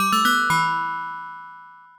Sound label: win, jingles; gbjam12